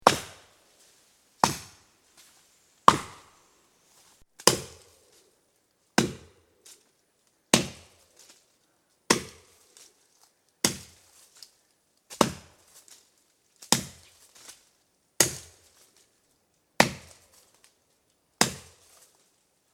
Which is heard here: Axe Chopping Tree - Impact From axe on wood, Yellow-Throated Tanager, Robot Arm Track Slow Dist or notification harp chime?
Axe Chopping Tree - Impact From axe on wood